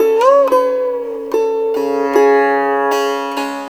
SITAR; Spectrasonics; Heart of Asia

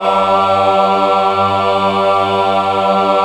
G2 MW AH, Choir; USB Soundscan vol.28; Acoustic & Synth; AKAI

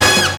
HIT, STABS

